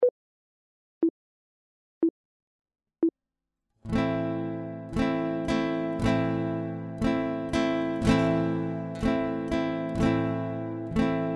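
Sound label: chord, guitar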